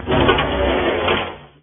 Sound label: door open